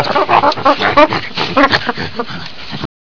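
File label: tickling monkey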